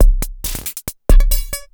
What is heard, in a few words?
percussion loops, Techno, 138; PS-45 WAV Techno ID